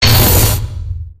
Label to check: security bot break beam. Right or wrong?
Right